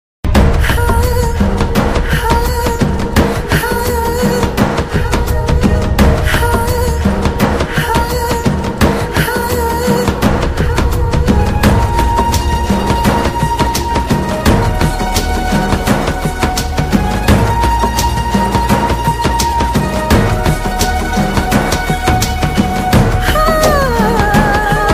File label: excellent humming female; Indian